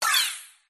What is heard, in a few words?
negative miss or action sound arcade casino kids mobile app